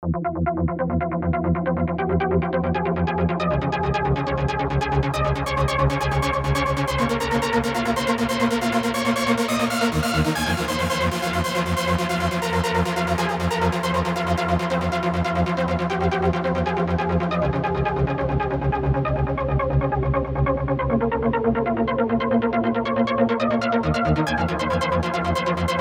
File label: trance drive